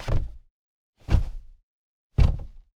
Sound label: INT Body Thump in Car